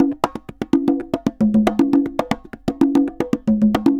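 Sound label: CONGAS SALSA, salsa drums and percussion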